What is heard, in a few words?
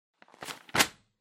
Flyer Put Down